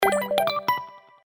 Alert

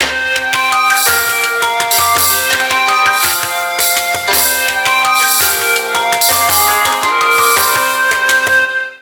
music irish; Samsung GT-i7680; cmcc

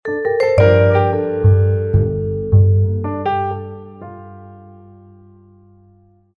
boot audio; Cubot; X19

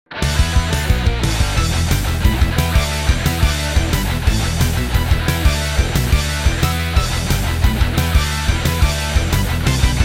Fire Plectrum; Windows Phone apps

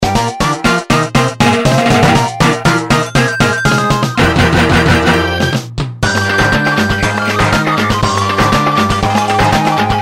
Three Old Women, ringtones, Latin

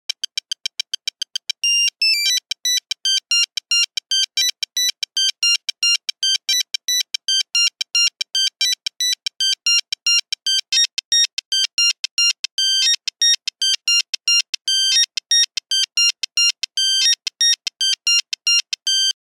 Nokia Buzzer Technoe; Windows Phone apps

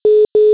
Call wait; Nokia Lumia 920 China WP8.1

